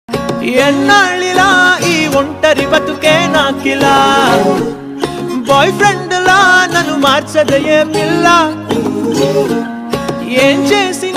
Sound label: singles anthem